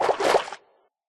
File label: swim, fish; Minecraft